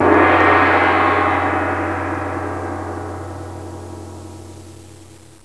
GONG

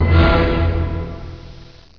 OCTAVE E, ORCHHITS; MUSIC

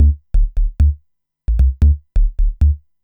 bass, dance

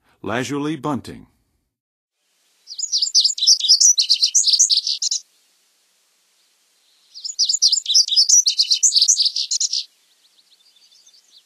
Lazuli Bunting, Western USA Songbirds; Nature Songs; N. America; Songs of Birds of North America

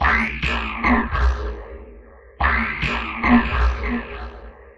Beat, Concrete Beats, Electronica

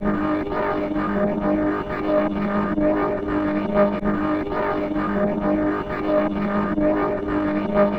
Burn Out, Electronica Textures

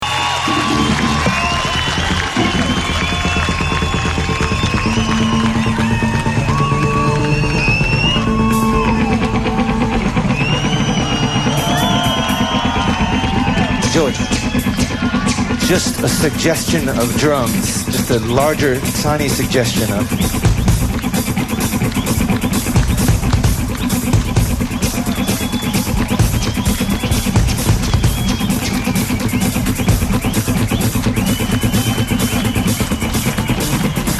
Improvisation; Organic Soundball

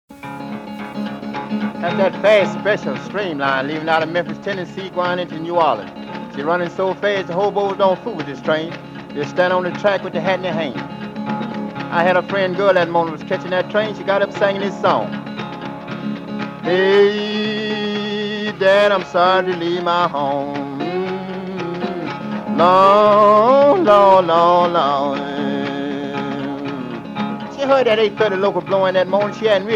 Slide Guitar; Jazz & Rhythm & Blues

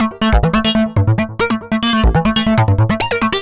Pinch Synth

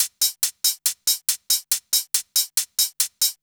Hi Hat Loop, Dance, Beats

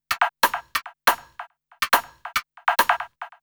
Perc Loop, Dance, Beats

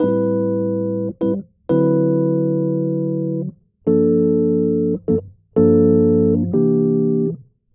MELLOW JAZZ-FUNK ELEMENTS

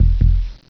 HEART, HUMAN

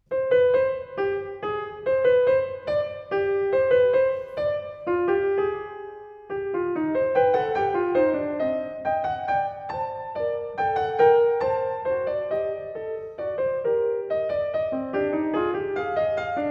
Fugue No. 2 in C Minor, BWV 847; Bach; The Well-Tempered Clavier; Book 1

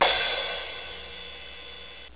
CYMBAL

